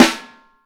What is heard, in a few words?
Vintage Snare Drum